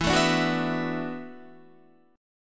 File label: E 9th Suspended 4th E down Guitar; E9sus4 strummed